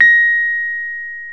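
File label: piano Rhodes Mk.V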